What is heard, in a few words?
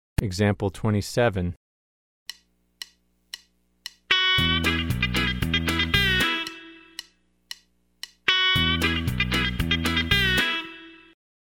Country Rock